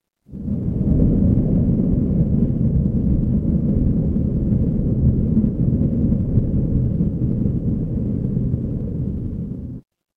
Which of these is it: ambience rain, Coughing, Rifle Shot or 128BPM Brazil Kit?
ambience rain